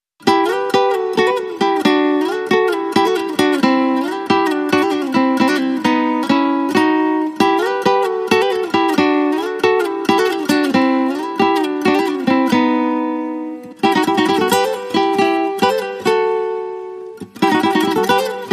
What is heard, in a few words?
Spanish Guitar Guitar Only